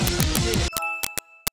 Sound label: Shutdown